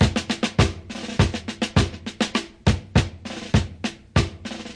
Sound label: 101 marching, DRUM LOOPS and BREAKS